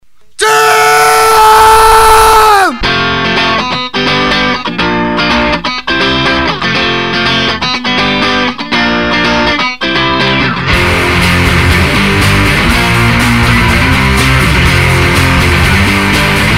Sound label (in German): kellerkonzert scream